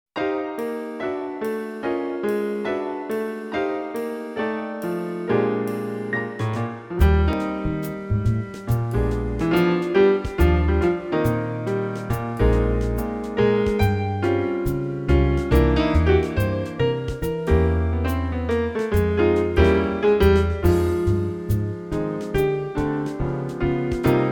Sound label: Jazz Nocturne